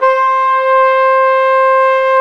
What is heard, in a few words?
WND FLG SO, Hybrid Winds Flugel & Sop